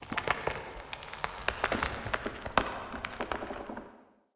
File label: Wake Up Loop Root Creak; Cinematics; Bromius; Bromius Quest Wake Up Loop